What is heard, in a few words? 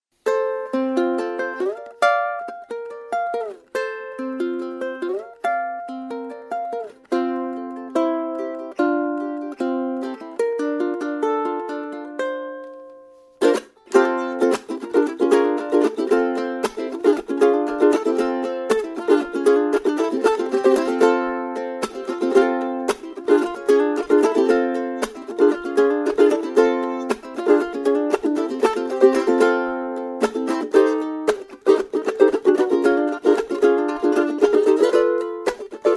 concert limba, guitar